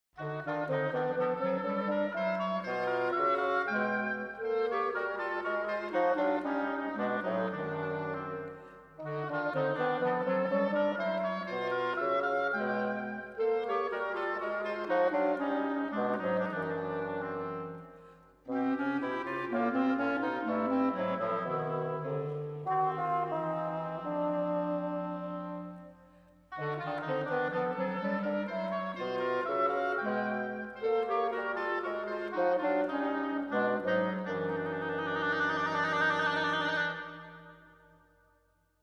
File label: oboe, clarinet and bassoon